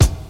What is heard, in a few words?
2000s rich high end kick single hit E key; kick drum samples